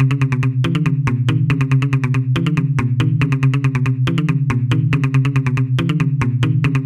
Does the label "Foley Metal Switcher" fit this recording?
No